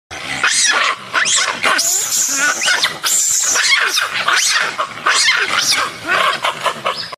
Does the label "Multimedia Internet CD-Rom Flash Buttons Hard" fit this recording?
No